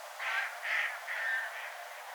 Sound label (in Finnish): sinisorsa ja metsahanhi